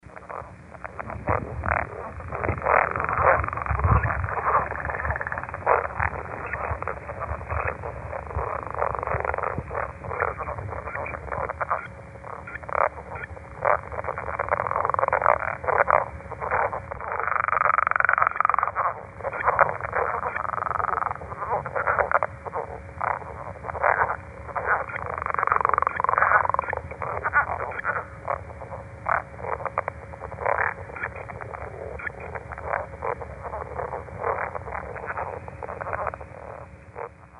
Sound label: Northern Leopard Frog; Wildlife